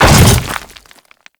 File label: Hammer Boss Land Dirt, footsteps; Warframe; Grineer Hammer Boss